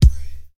loud reggae kick drum single shot e key

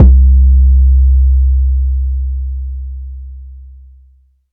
phonk kicks oneshot - 808 C; c major

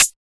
Good Pedal Hi-Hat Sound G# Key, closed high hat

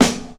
dry snare single hit d sharp key; Duration 0:01; snare drum samples

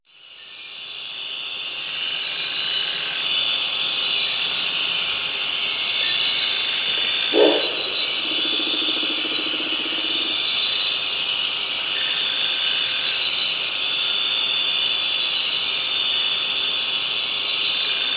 insects and barking frogs; soundscapes

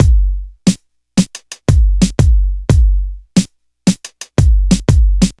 89 bpm drum loop sample g key

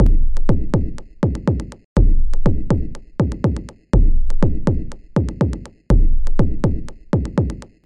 percussion synth massive 1 fm, clicky and clear; drum loops; kick loops

